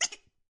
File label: quack